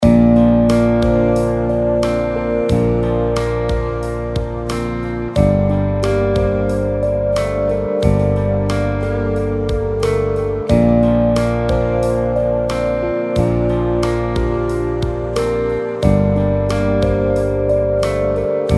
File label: Neutral Background Loop